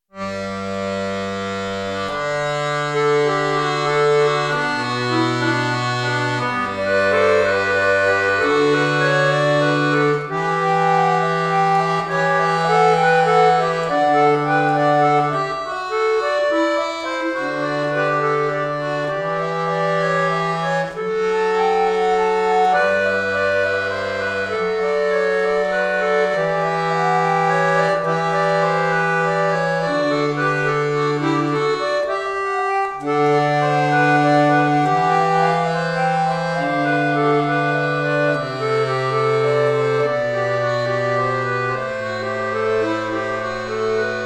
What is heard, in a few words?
buxtehude choralvorspiel